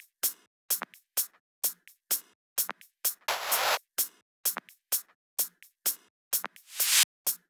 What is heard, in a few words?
128BPM Drums; Vengeance Samples; Celebration Kit